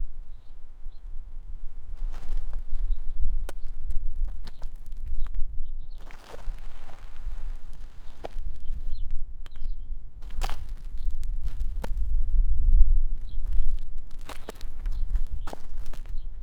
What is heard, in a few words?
walking footsteps in sile